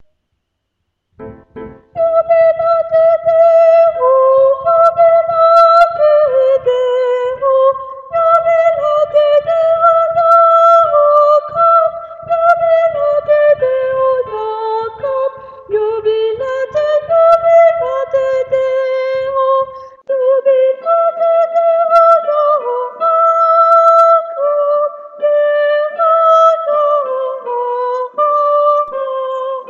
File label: soprano; jubilate